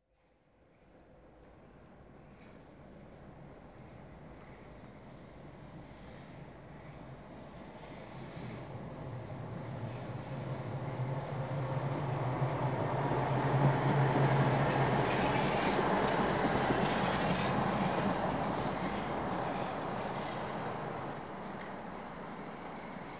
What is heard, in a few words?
Streetcar Passes Right To Left; The Czech Republic - Slovakia